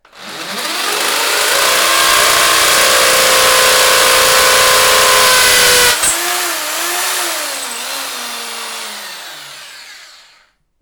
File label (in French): perceuse